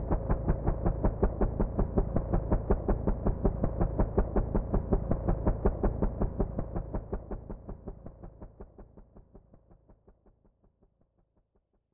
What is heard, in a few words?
Chopper Flight; Urban Strike; SNES gamerip